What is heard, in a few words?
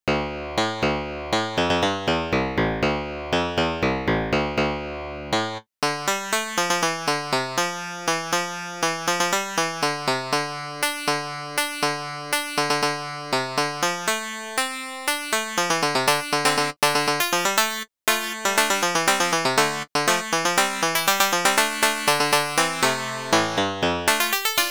medieval harp; ludum dare 40